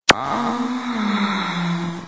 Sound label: Laser